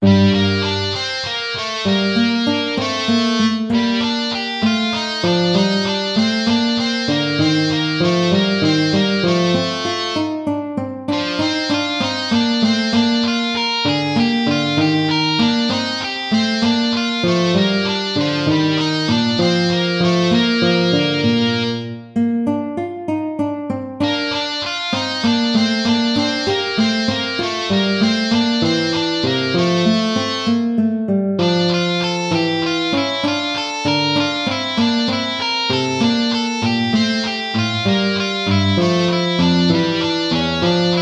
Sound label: gigue